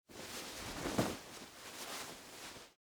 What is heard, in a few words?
Suit Coat Unfold, Foley, Clothes, Suit, Coat, Unfold, From, Arm; BlastWave